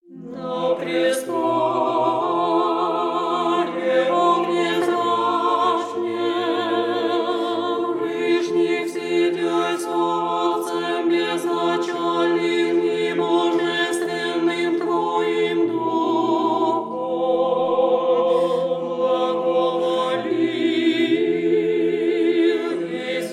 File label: Tropar Glas 1